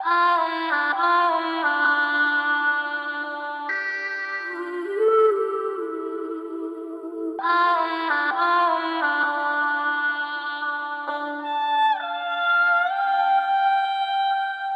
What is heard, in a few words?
65 BPM D Min Vocal; Loop Stems; Cymatics; Perfect Timing